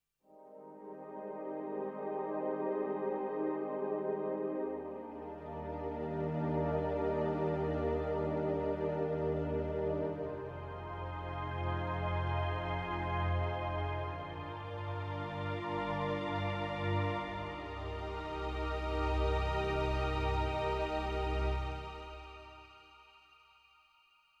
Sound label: Pads